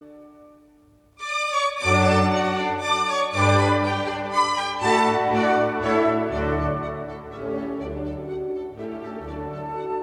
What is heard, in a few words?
Serenade No. 7 in D Major, K. 250 VIII. Adagio - Allegro assai; Haffner; Mozart, Wolfgang Amadeus